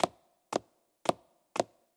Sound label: Foot Step; blue archive